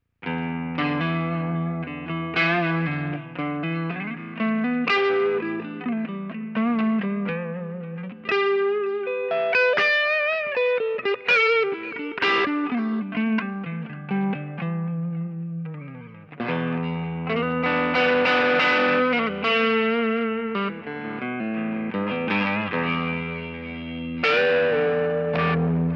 Mad Professor Super Black Blackface Less Gain Delay